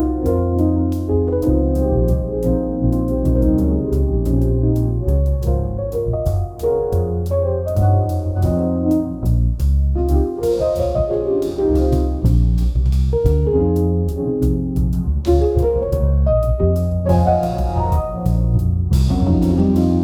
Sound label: this jazz background trac, melodies